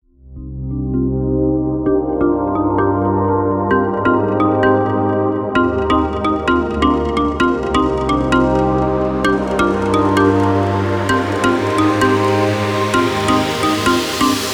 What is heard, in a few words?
Trendy Electronics